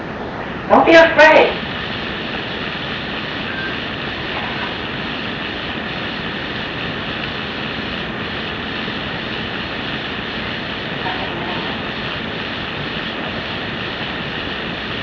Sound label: EVP Session; COPS-EVP; Grove City 2008 Case